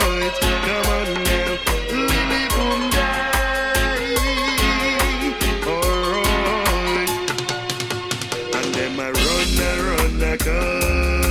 nyabinghi drums

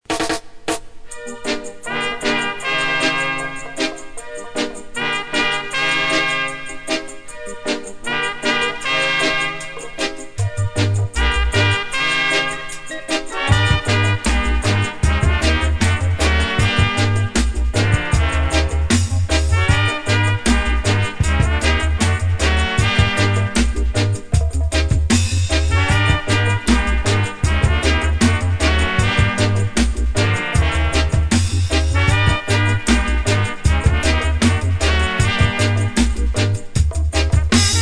horn

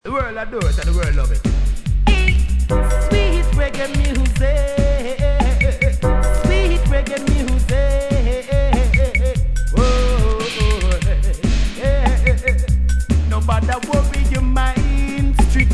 strictly reggae music

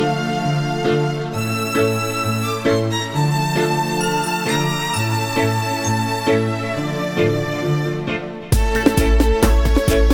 violinist melody

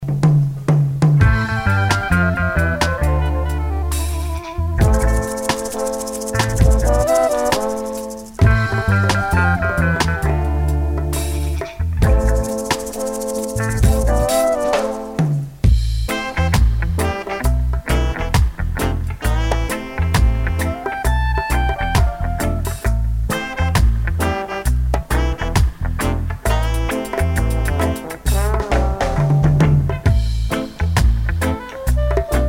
horn

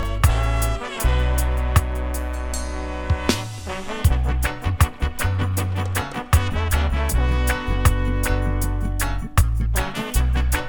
horns

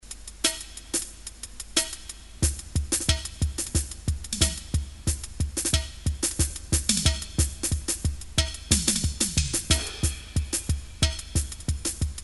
sticksman dub

